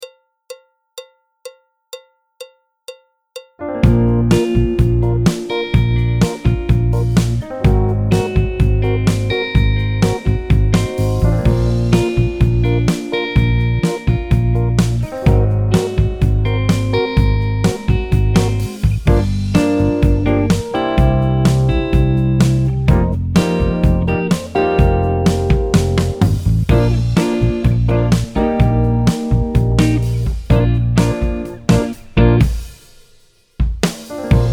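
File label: backing track, Jazz; clarinet, flute; Jazz Syllabus Level 3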